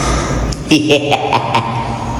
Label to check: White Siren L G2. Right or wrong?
Wrong